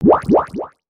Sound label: drink; aronwk collection; ndaudio